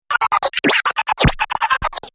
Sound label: Scratch